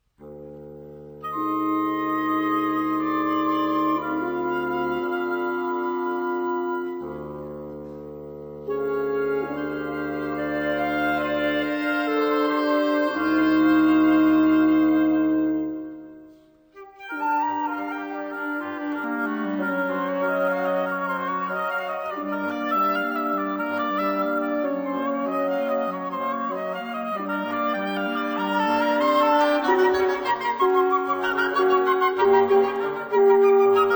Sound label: Scene for Wind Quintet opening